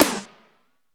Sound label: clicky acoustic snare sample D key, snare sample tuned to the D note